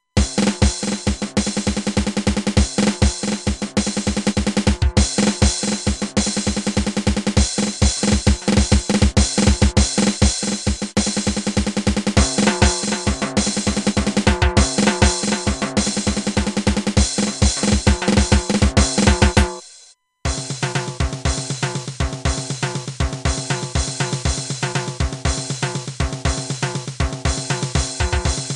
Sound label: funk